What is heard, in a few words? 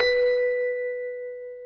Bell B4